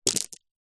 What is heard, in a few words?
necklace small stones pebbles set down carpet